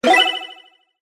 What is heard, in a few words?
button